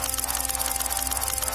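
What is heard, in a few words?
free spins splash wheel spin; wild worlds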